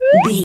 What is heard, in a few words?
whistle slide up